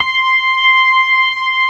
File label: ORGAN COMBOS; Keith Emerson Signature Series